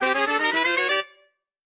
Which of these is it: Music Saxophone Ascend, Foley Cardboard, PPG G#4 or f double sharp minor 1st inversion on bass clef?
Music Saxophone Ascend